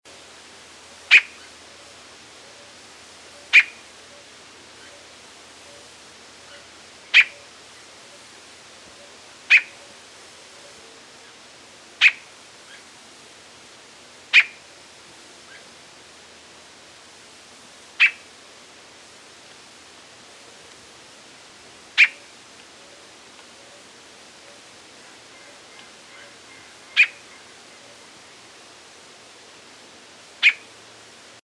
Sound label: Scarlet throated tanager